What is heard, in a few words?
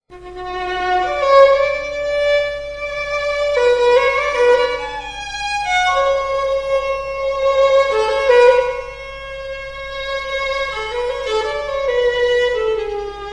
Violin